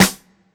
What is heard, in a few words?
snare drum sample f sharp key